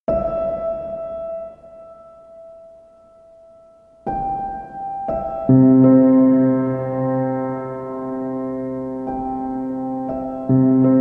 Piano Sounds

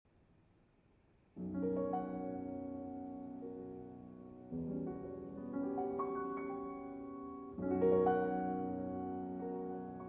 Violin and Piano

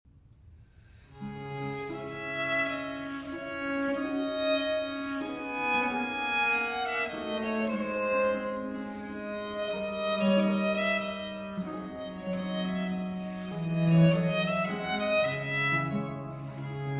Violin Sonata No. 1 in D Minor VI. Aria

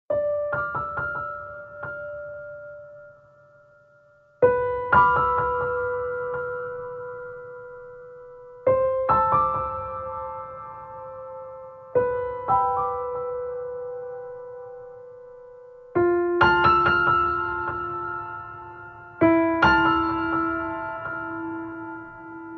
Tikkoun (Piano 1)

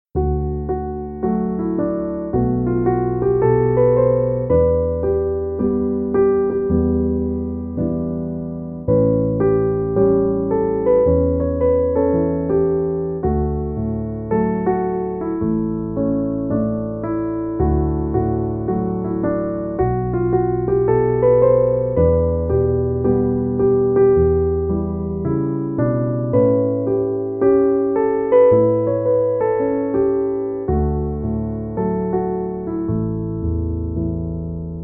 Piano Instrumental

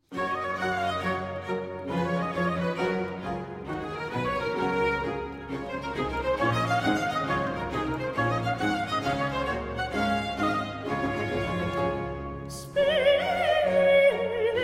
Duetto; Alceste, Martesia

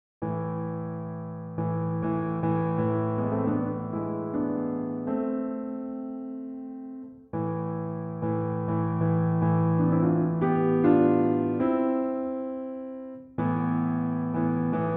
Solo Piano; Faust; King of Thule; Jewel Song; Flower Song; Garden Scene

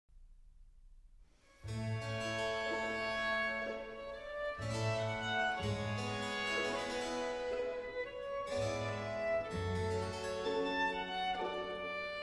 Violin Concerto in G Minor, Largo; BWV 1056R

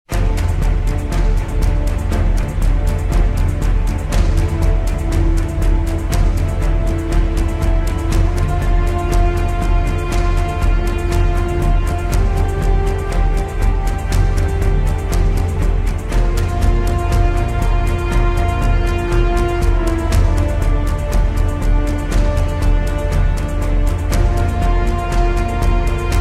Instrumental

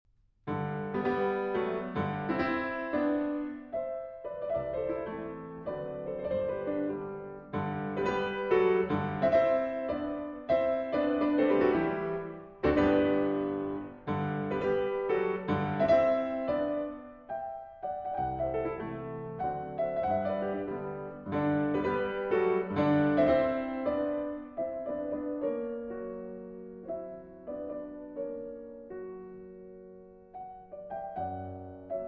Mazurka; Soirées Musicales, Op. 6

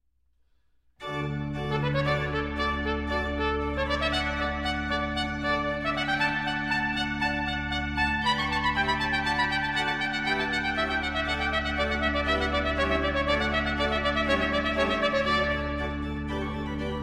trumpet; Martini Toccata in D Major for Organ (Arr. for Trumpet)

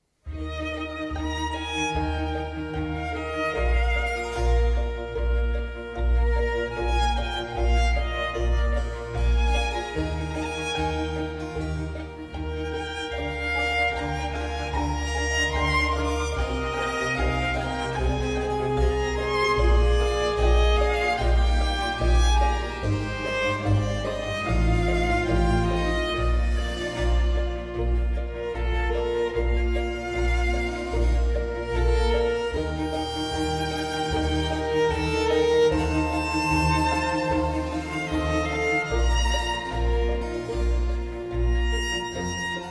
Violin Concerto in F Minor, Largo; RV 297, “L'inverno”

